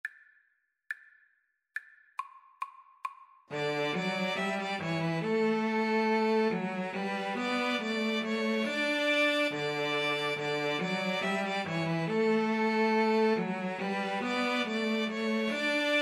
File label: ding dong merrily, String trio